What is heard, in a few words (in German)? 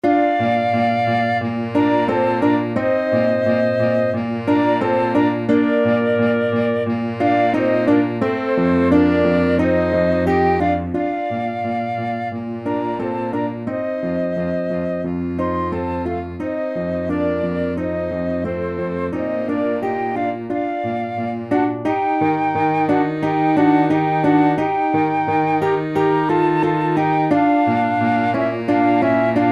Flieszende Wasser Ensemble Bb Dur